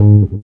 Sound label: Elc Bass; Equipped Music